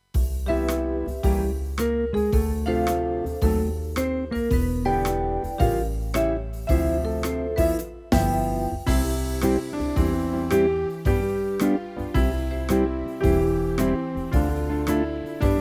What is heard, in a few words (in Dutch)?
Met Piano